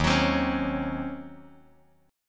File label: D sharp Minor 13th D sharp Bass Standard, chord; strummed; JGuitar